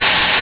Extinguisher; GLScene; AirBlast

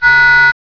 Missile Alert; GLScene; AirBlast

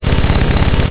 MGun Long; GLScene; AirBlast

